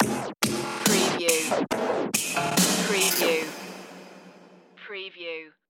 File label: oceanic stinger